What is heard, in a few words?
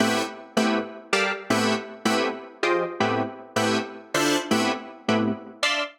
Big Organ A Minor 160 bpm; MIDI Clips; Tonal; Synth; Ableton; Skitter and Step